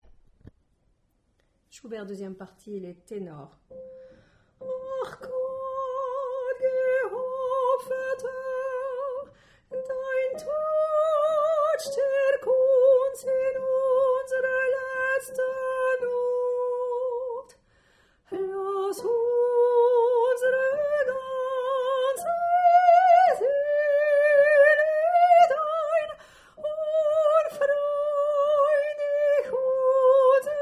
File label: Tenor; schubert